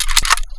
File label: aug bolt pull, weapons